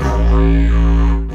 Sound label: Didgeridoo Kit Samples